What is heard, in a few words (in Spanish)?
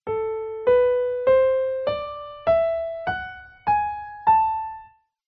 escala menor melodica